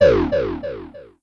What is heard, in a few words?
Death; Rokku; blue archive